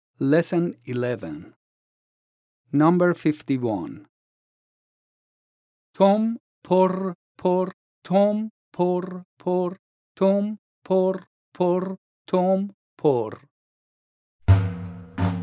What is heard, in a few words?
vocalization and Porr, Daf